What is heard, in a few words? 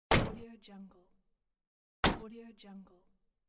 car door close